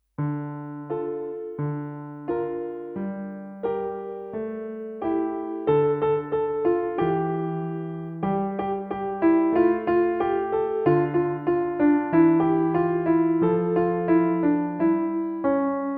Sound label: PIANO